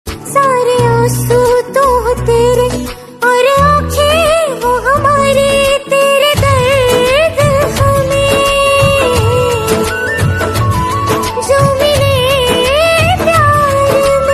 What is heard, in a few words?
female version